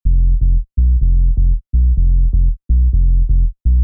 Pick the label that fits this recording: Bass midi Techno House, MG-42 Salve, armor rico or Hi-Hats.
Bass midi Techno House